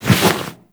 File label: Misc Foley; Warframe; Characters; Tenno